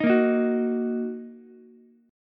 Eb Minor Eb Ukulele; Ebm strummed; JGuitar

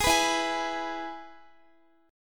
E Major guitar chord; strummed; x,x,18,16,16,15

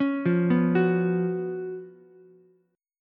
C sharp 11th C sharp, Ukulele C6; arpeggiated; C#11; JGuitar

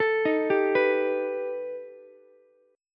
B Augmented 7th B down Ukulele; arpeggiated; JGuitar

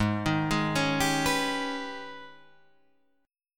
G sharp Minor Major 11th, Guitar Drop D

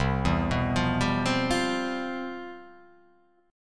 C Major 11th C down; CM11 arpeggiated; JGuitar

